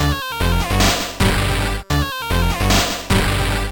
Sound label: beatbox; BLAIZER